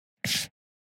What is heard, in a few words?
UI dumping sound